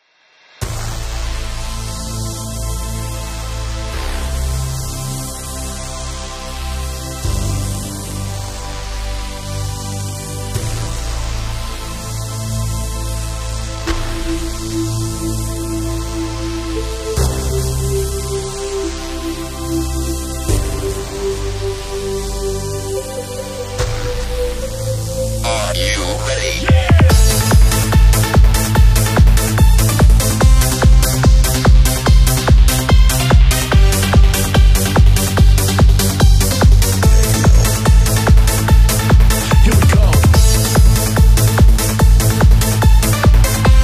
techno eurodance

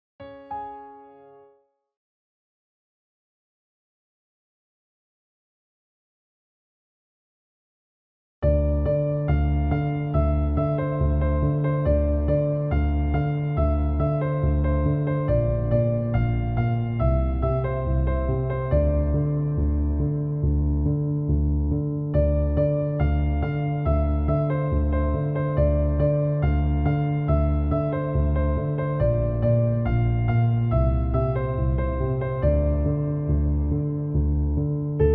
easy piano; tutorial

